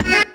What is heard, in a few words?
B STAB, funky